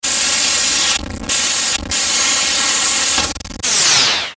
Stutter Static Glitch Descend, Stutter Reverb Swell; Production Element Imaging Element Accent Transition